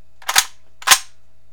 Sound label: rifle cock